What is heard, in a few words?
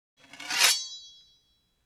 sword shing; fights bodyfalls; Asian Show Sound Effects